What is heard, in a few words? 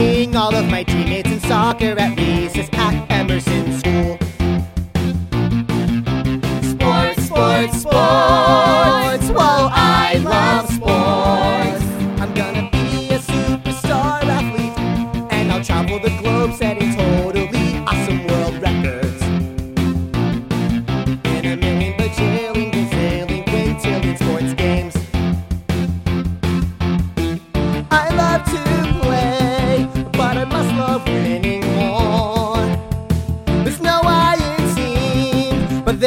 Sports Audition Cut Vocal Guide